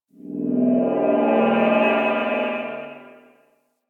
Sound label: Dark Texture, Ambience; Warframe; Corpus Railjack